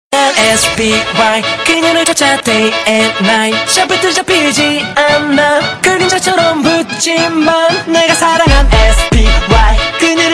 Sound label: laugh